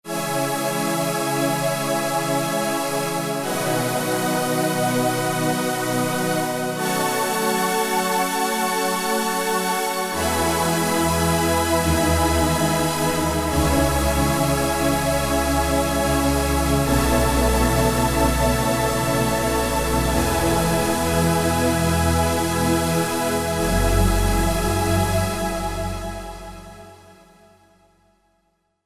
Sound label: synth textures, Pad; Versova Pad; Apple GarageBand 2